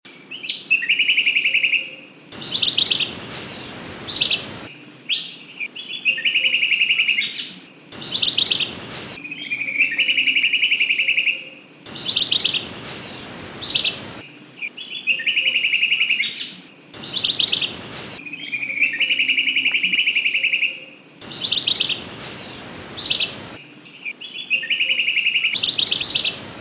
lichmera squamata

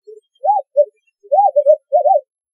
strix woodfordii

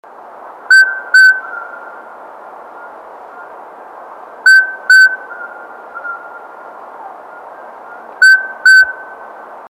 otus spilocephalus

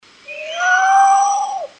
strix hylophila

